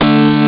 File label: snake metal; sonix; Rise Of The Dragon; chipmusic